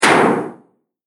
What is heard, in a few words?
Heavyweight Explosion; Bomb Sound Effects; Impact / Explosion Sound Effect; Andrew Fleming